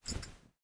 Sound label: armor; smite; osiris